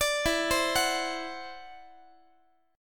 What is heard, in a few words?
E 7th Suspended 2nd Sharp 5th E down Guitar 4A 4E 5A 5D 5G sharp 6C sharp; arpeggiated; chord; JGuitar